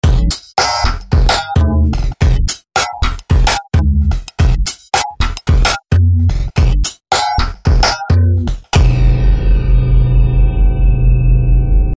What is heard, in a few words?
drums